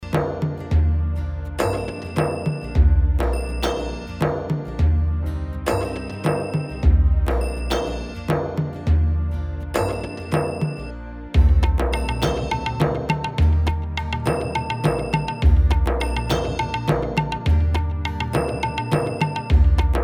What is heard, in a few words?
Karaoke Song